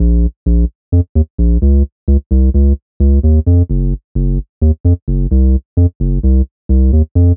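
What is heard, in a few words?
Bass Loop 130 B flat minor, Downtown House; Bass Loops 130bpm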